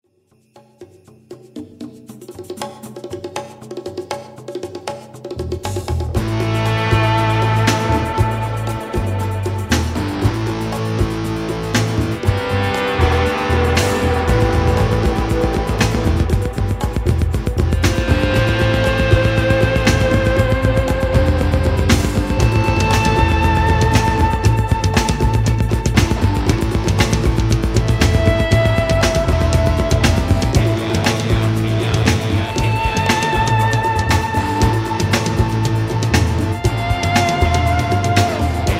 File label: Drumming for rain